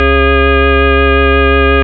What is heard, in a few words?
organ